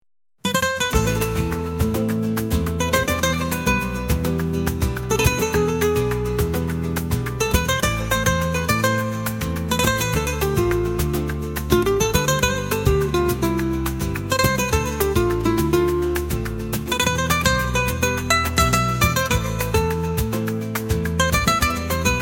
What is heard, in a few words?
flames of desire, ispanskaya